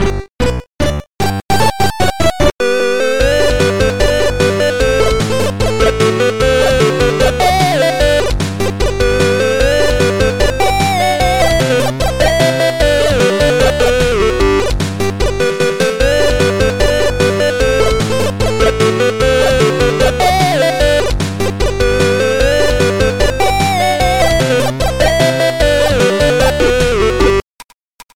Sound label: 8 Bits Ringtone